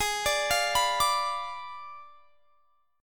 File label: Ab Diminished 7th Ab; Abdim7; arpeggiated; x,23,24,22,24,22